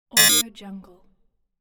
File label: multimedia error notification